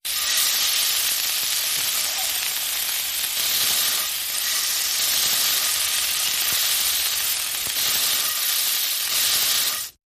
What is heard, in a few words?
Sizzling Flesh